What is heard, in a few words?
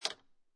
Clothes Hanger Placed On Pole, Foley, Clothing Hanger, Metal, Being Placed On Metal Clothes Hanger Pole; Set Down